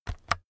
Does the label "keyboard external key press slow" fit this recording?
Yes